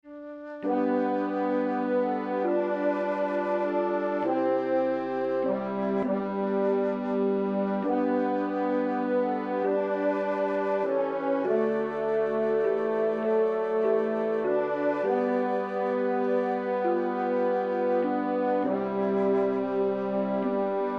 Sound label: midi; amazing grace